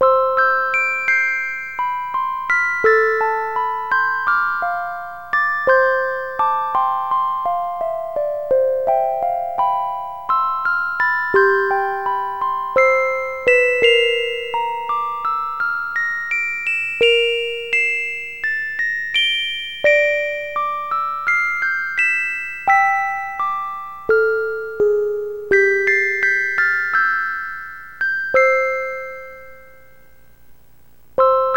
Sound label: Music Box; animal crossing let's go to the city